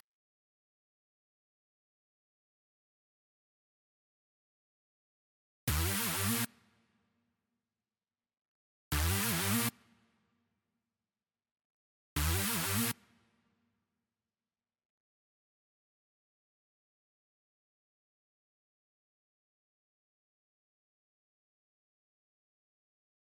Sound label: Frantic Mix Loop Stem Layer 2